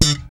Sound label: POP D, Bass; Spectrasonics - Bass Legends CD 1; ALL THUMBS